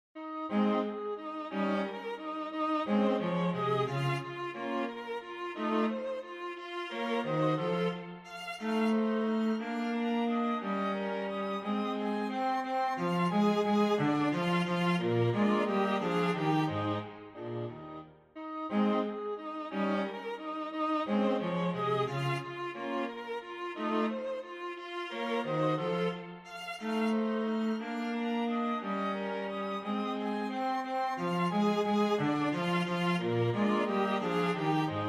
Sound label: Minuet Quatuor; Ludwig van Beethoven